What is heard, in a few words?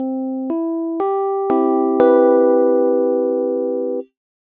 C Major Equal Temperament